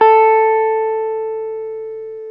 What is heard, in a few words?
GUI SUS A 3, E-Guitar; E-GUITARS